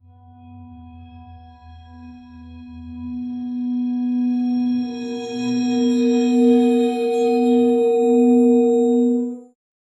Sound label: a soft reverse chime; bell strike